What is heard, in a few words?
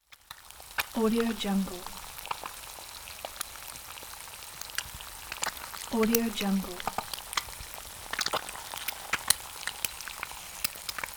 fried eggs noise